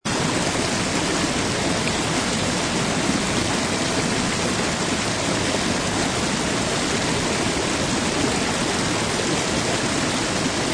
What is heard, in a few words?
Waterfall; fa battlemaps